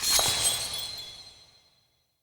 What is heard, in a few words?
UI Claim Reward; Codex